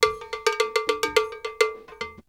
Drumloop; ZERO G - Datafile DISC 3

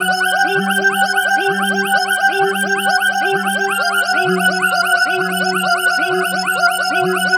NRG Pad

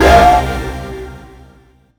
STAB

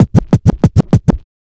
Kick Scratch

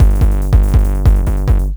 DR909 140, Hard & Loud Techno; USB Soundscan vol.01; AKAI

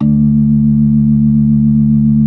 B3 D 3; Best Service; Advanced Media Trax Modern Composer; B3 LES 1

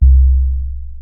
Sub Sonic Bass C2

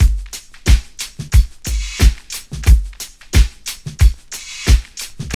TRIP 90, trip loop

